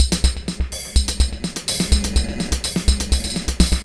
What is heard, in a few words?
Percussive, Drums & Percussion, Beat Components; Electrocution